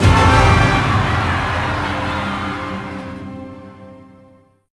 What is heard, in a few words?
seconds left